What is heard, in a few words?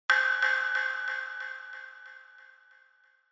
Single Accent Delay